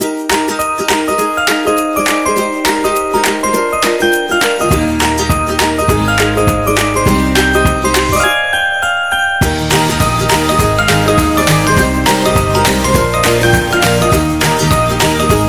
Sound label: The Happy Winter, happy music